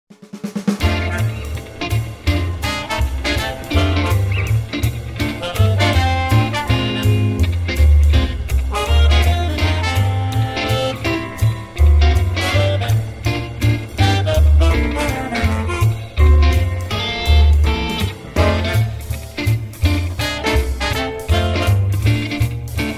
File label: Alt Sound Track